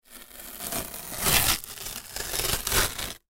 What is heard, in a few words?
Cardboard Rip Tear; Cardboard Rip Tear Short; Materials; Foley; Sound Effects:Materials; SoundEffects-Blastwave